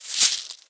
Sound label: bag pick